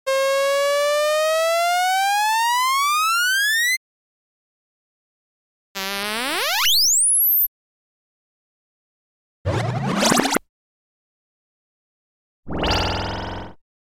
Rising Sound waves; 4 Various Oscillating Ascending Tones To High Frequency.; Synth Tones